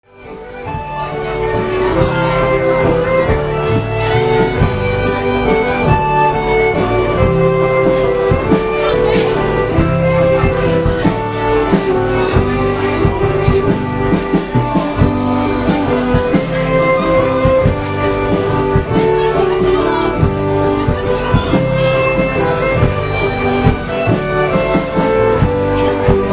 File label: Gaelic Waltz